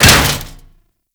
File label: Mace Impact Metal